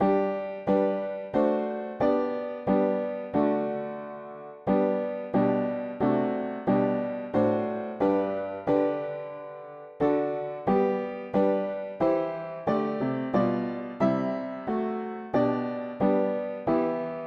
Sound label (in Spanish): mono piano; hymns; Himnario pequeno 2Pte